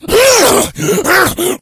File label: rage, infected, male